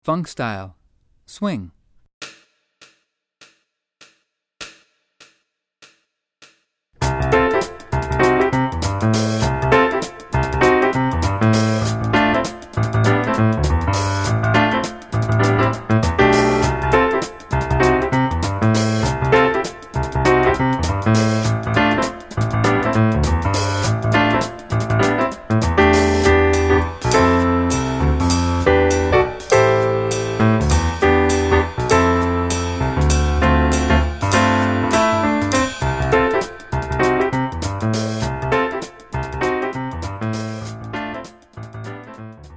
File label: funk